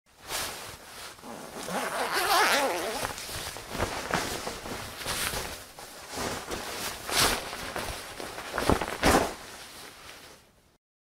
zvuk rastegivaniya kurtki; Звуки куртки, штанов и вещей в шкафу на вешалке

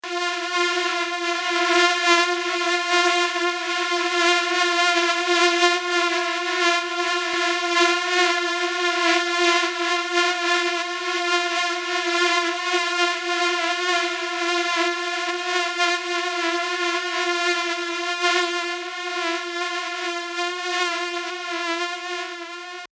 moshka

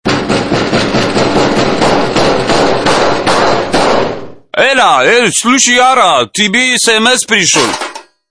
stuchit sms

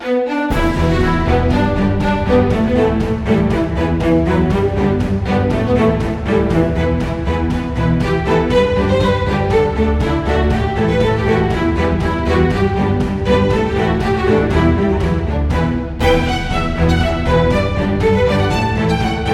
muzyka dlya igr

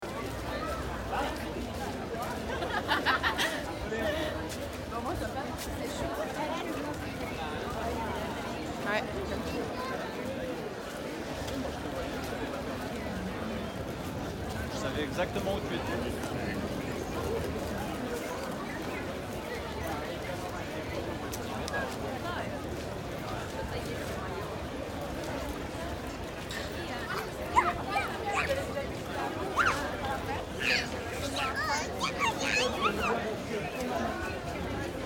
ochered, Звуки большого скопления людей, одним словом толпы, их шум

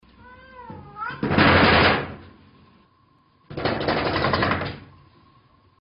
kot stuchit v dver lapami

